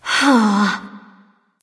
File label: sigh; smite; nox